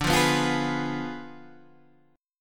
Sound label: D Diminished 7th, D, down, Guitar Open G